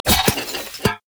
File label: sightward horse gong; LoL; items